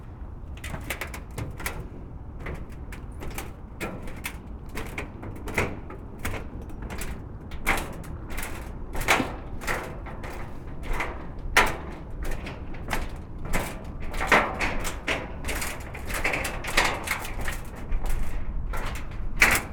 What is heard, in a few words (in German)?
Bruecke mit Schnee + Zug, London; London 3 (Walkman Performance)